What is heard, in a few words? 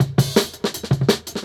Jungle Breaks